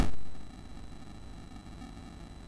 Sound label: sid MOS6581R2, GAMES; Face Off